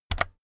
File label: keyboard external key press very fast